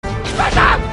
LEGO SPACESHIP

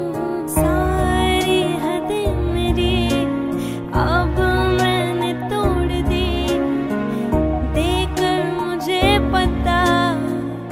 bass

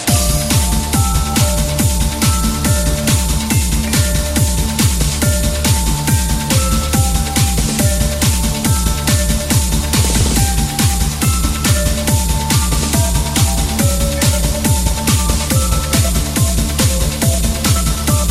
music box; alternative

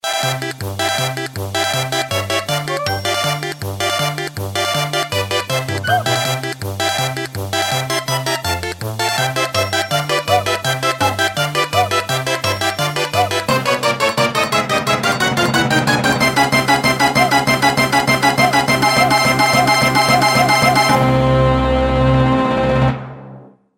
Fast flying, Nokia 6020 ringtone

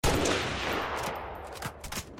dmr reload; ringtones; games; halo

